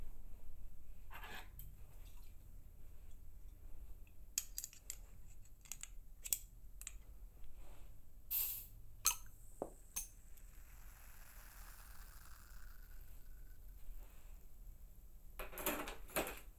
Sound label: Mineral Water, binaural